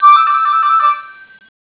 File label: gain life; Bounce Touch